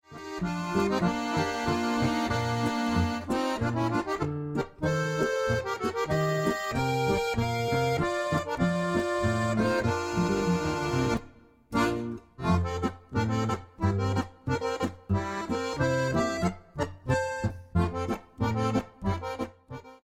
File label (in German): boehmische polka